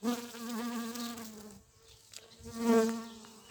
Abeilles 1x faible 1x FORT; Vols Bourdn abeilles

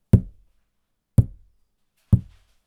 Toms médians boite carton; Percu Bois

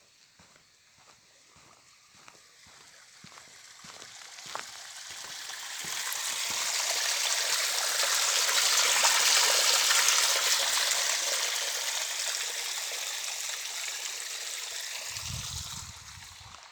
Coulis de l'eau caverneux; Bruitages; Eaux

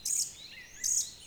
2x Trlip, Oiseaux

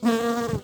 Abeille zzt nervx fort franc; Vols Bourdn abeilles

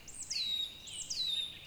x2 serie, Oiseaux

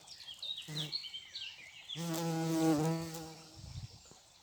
Bourdons léger; Vols Bourdn abeilles

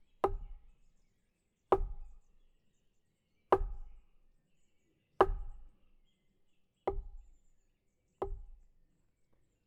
Gourdin de près x6; Percu Bois

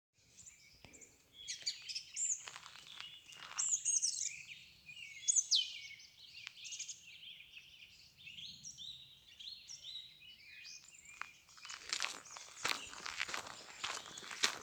oiseaux marche

